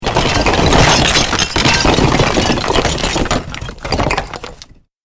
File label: Brick wall tumbling to the floor; Collapsing Wall; Debris (Sound Effects:Materials)